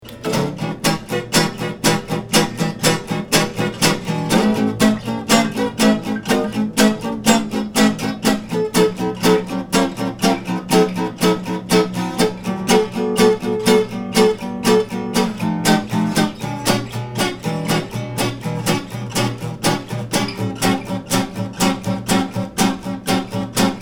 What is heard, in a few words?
1990 triggs stromberg 400 blonde